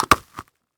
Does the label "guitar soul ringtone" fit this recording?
No